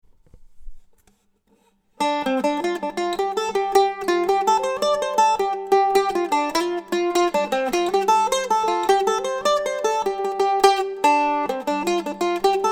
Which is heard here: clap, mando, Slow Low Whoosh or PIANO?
mando